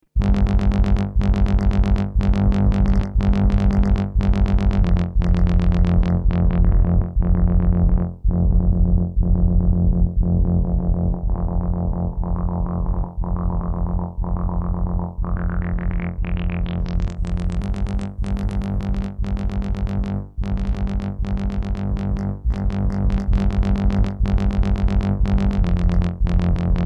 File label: filter